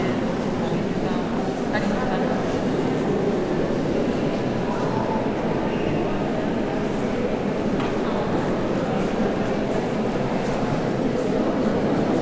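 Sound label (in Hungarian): szájtátás mecset; meszkita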